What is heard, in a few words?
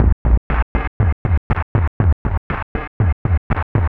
Processed Perc